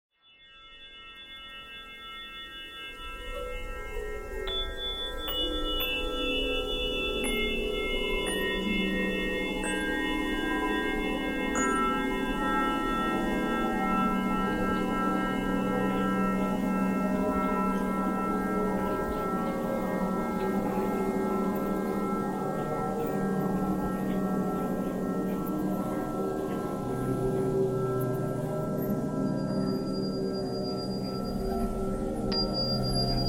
vox breathing noise

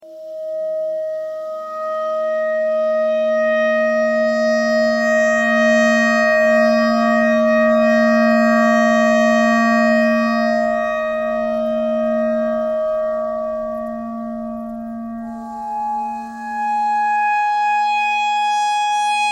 clarinet clarinet notes e thread pentatonic

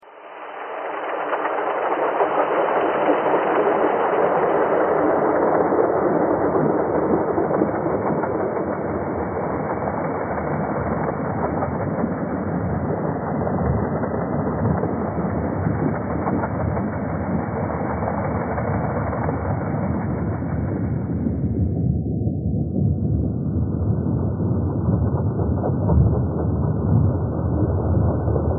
thread simple

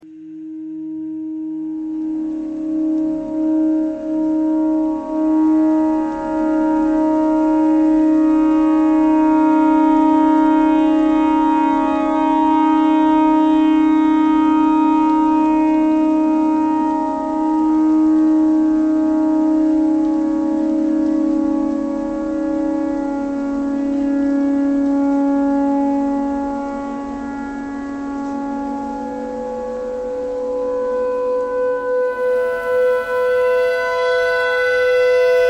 tornado siren clarinet notes e noise

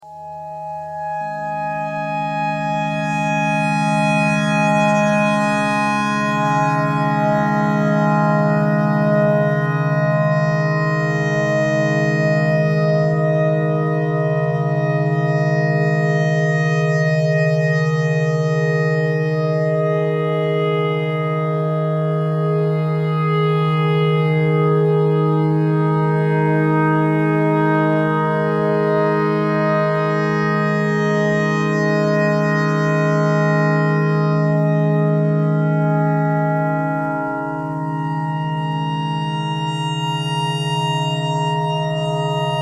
clarinet clarinet notes e simple all

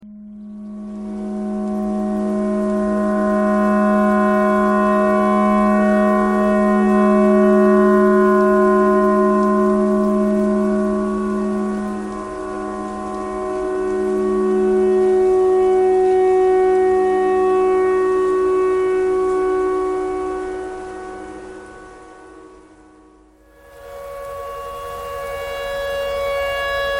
tornado siren clarinet simple

